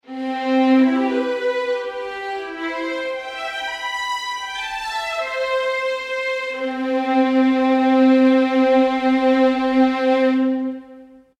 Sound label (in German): Legato Violinen; Wallfisch